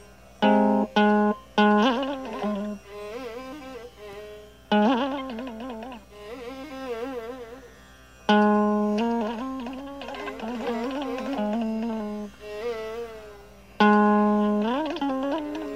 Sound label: Todi initial rhyme, ālāpana